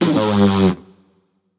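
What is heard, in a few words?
tongue, non-verbal; smite; voicepack; merlin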